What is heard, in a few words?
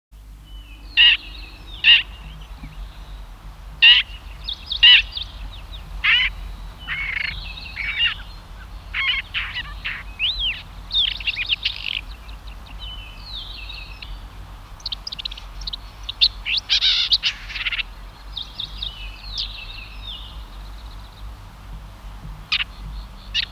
Red-backed Shrike